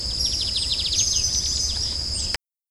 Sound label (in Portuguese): bigodinho canto, aves